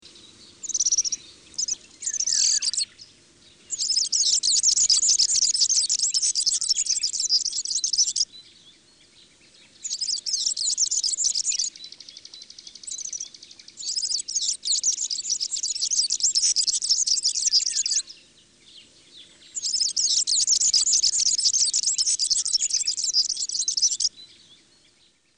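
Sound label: Serin; Madarak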